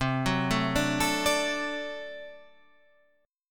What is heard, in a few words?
D Major C, guitar